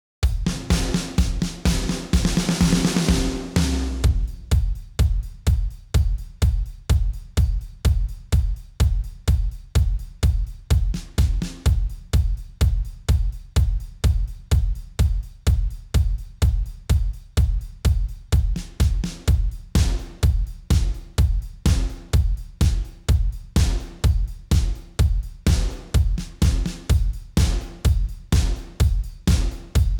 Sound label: drums stem